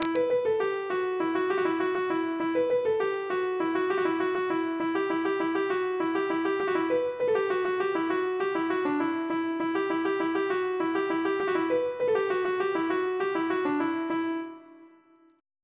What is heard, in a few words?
laride gavotte; bzh; boeuf trad